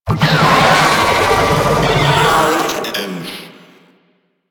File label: Proto Infested Idle, Vocals; Warframe; Babau